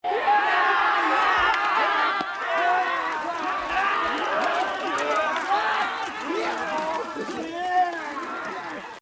japan war-cry

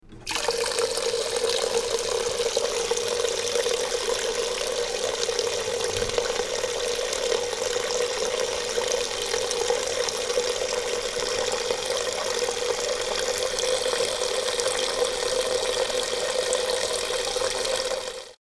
water kitchen

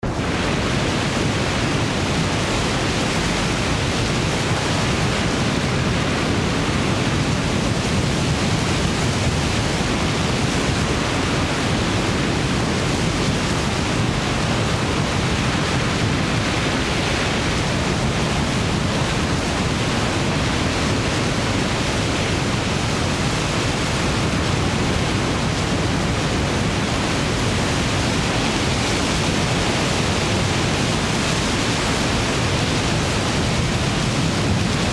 japan landslide

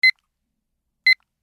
pi

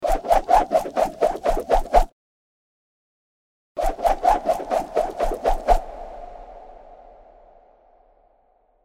spin

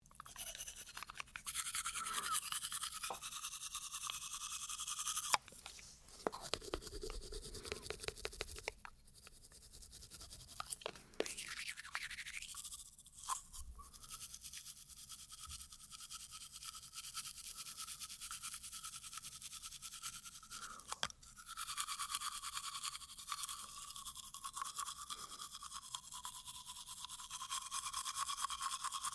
tooth brushing

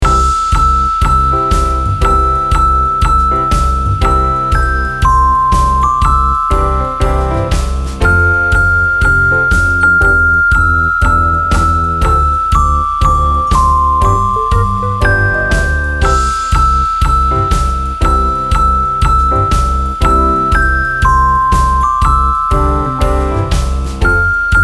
jingle bells

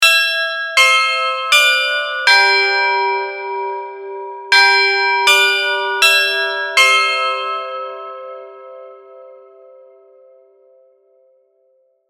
kinkonkankon

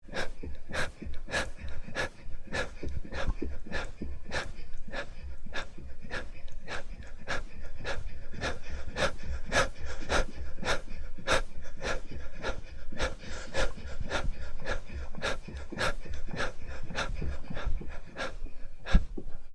breath run fast

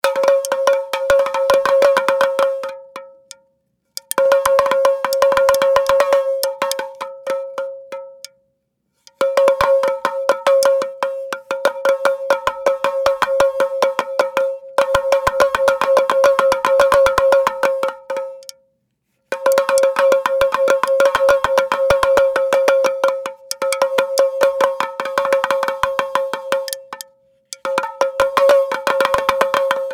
denden taiko

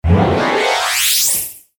sfx up

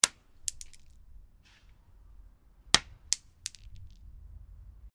stone

